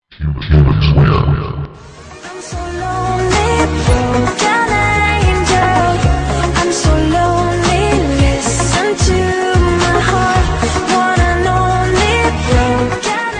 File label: humans win